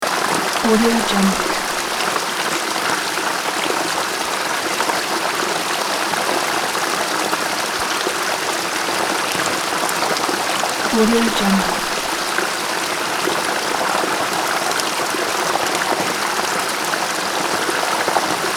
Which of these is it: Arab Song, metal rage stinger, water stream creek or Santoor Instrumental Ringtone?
water stream creek